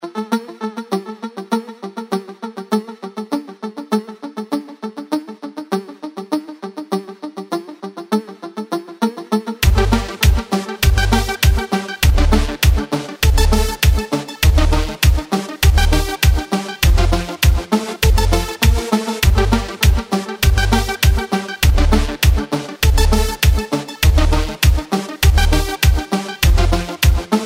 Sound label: electro